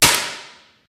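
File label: physics clipboard impact large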